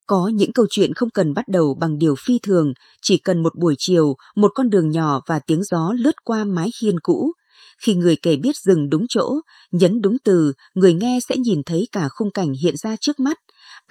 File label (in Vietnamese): an nhien natural